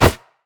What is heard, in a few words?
great wraith basic attack; LoL; monsters